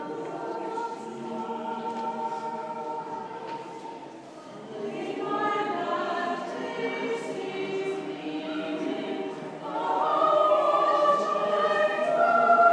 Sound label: choir; leeds light night